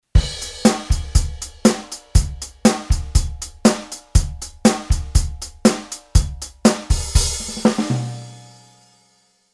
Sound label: Rhythm Kit Pop